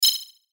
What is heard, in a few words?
chime digital twinkle; Multimedia; Chimes; Blastwave